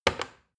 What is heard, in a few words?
plastic button start click heavy fast